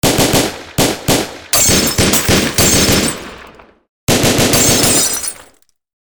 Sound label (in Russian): zvuk perestrelki